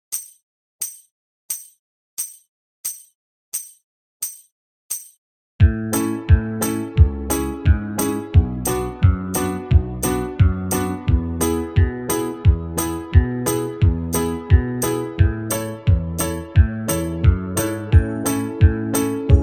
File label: key of a slow, banjo backing tracks